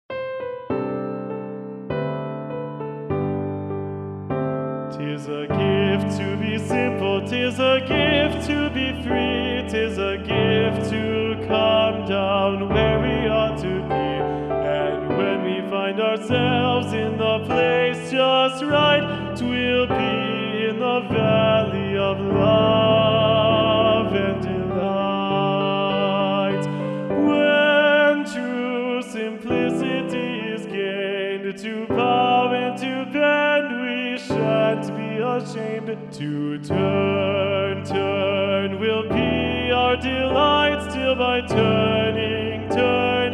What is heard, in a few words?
high basses, g major; simple gifts